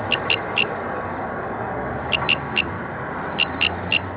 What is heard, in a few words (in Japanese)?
haraokamekoorogi; zukan